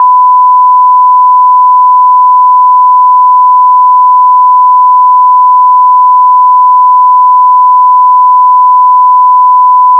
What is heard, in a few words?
1k Stereo 0 FSD; 1K Tone, Stereo, at FSD; test files